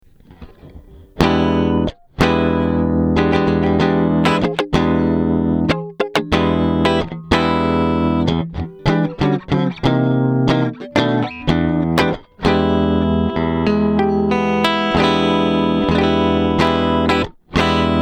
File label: Chandler TG 1 E Guit THD Lim 16db gr